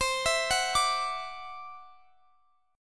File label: E 7th Suspended 2nd Sharp 5th C, guitar